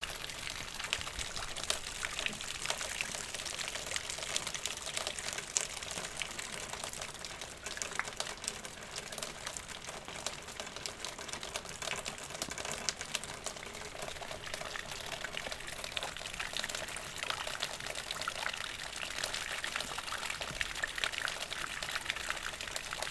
dripping rain drops

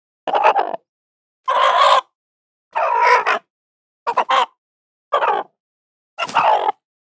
chicken screams nervous

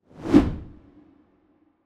swoosh

